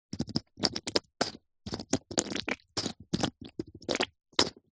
food drink bottle plastic sport squeeze water dribble nozzle